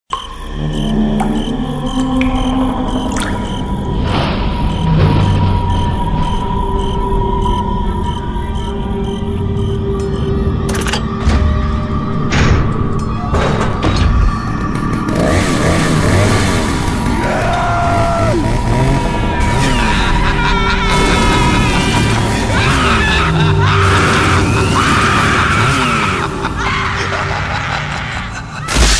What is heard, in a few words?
scary night horrifying music tone ringtone